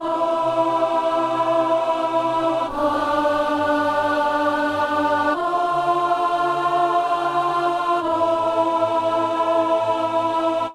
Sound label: alto; Messe de Saint-François-Xavier - Agnus